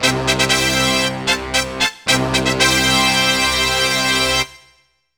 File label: Fanfari; Smeshariki Olympic Games